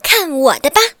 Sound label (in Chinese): 女入场; renlei